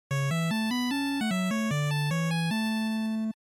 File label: NES Racing Clear